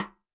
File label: Snare, Roland TD-20